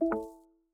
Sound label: Wireless Charging Started; OnePlus One